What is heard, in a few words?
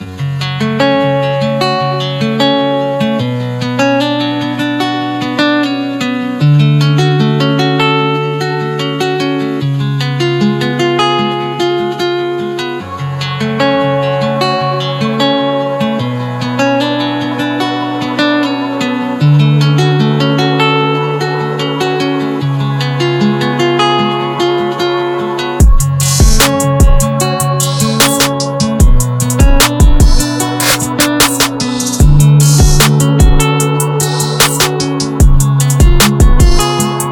green trap style beat; QWADE BEATS